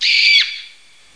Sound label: squeal